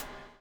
BUBL, BUBBLE; Spectrasonics - Backbeat

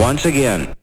VOX SHORTS; Megabass Remix! 2

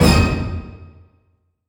Orchestral Punch; Sonic Foundry (Sony Creative Software); Dr. Fink's Funk Factory; Orchestral Hits

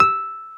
Wurly; Keyboards of The 60's and 70's

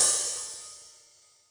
H Opn, Hi-Hat, Yamaha DTXpress

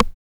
RIM; X-Static Goldmine 1

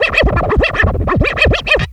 Scratch Tape; FX; Ambient Grooves

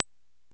HI ZAP; Rarefaction; Poke in The Ear With A Sharp Stick

